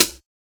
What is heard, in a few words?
Closed Hat; Ghosthack Advent Calendar 2017 Day 5